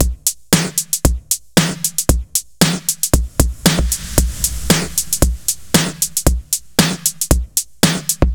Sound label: 115 Beat Skipper; Zero-G; Future Wave